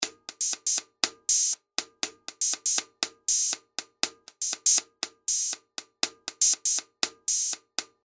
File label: hat humanise